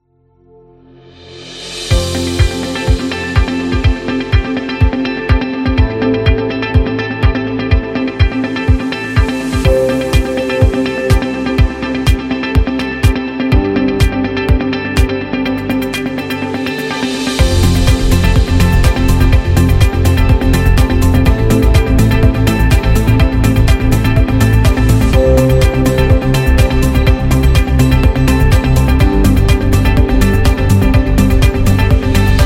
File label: Technology Advertising; friendly